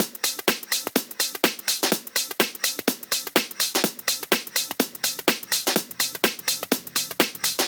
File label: top house fill breaks; drum loops